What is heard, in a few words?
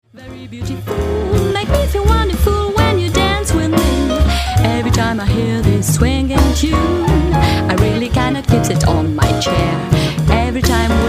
medley, swing